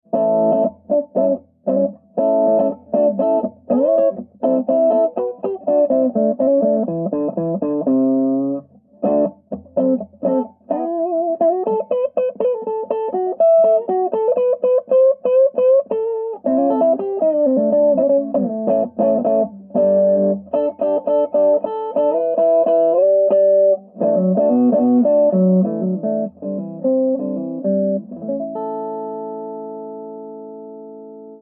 Elec Gtr Amp Two Mics; Manufacturer Audio

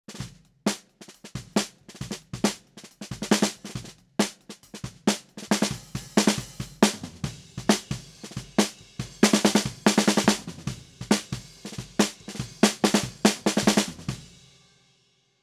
Snare Shoot Snr Bottom SM57

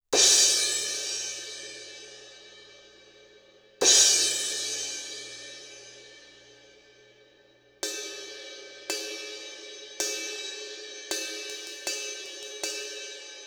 Cymb Crash Far Edge

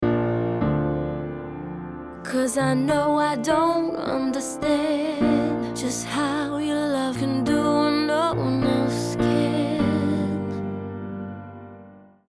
Piano Dull; HF Contrast; Mixing Lead Vocals

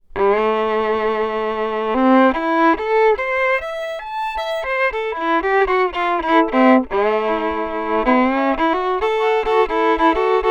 Violin Two Mics; Manufacturer Audio

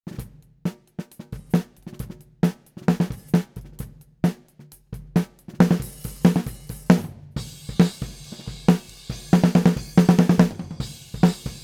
Snare Shoot Snr1 Top C414BULS

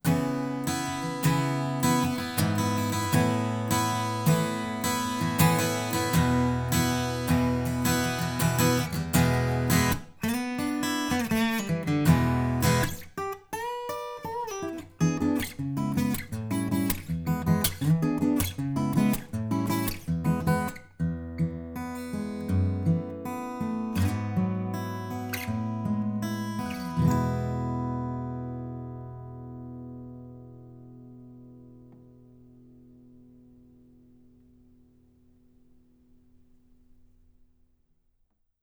Ac Gtr; Vanilla Far

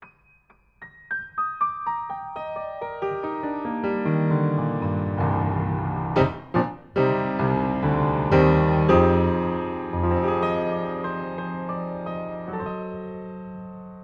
Piano Front To Back Mic

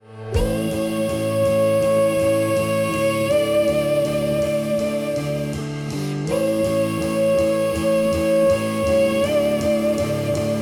Gtr Build Context

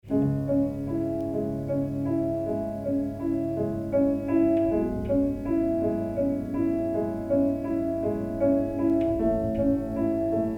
Resonators Piano Lampshades Stereo

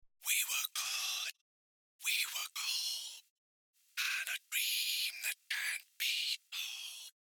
Whisper Solo

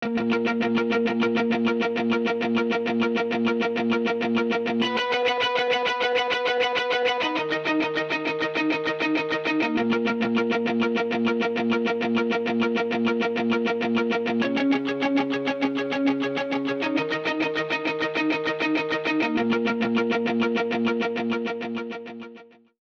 Gtr Arp Tremolo

